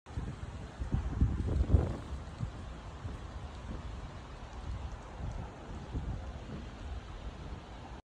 autumn wind

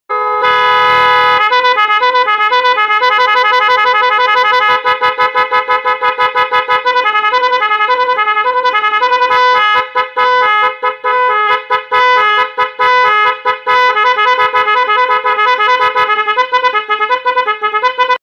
Horn; بوق بادی دری وری

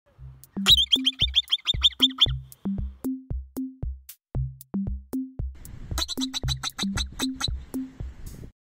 Marmot

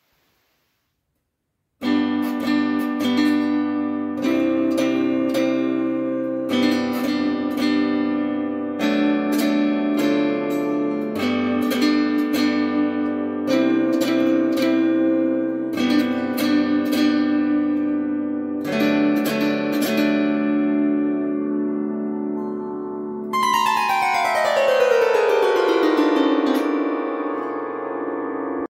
Zither

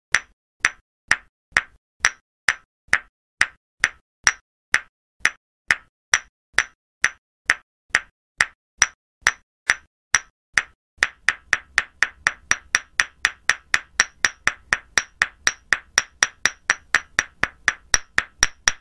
masher, گوشت کوب

